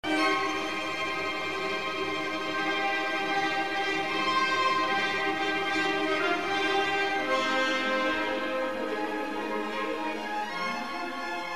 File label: viola fantasia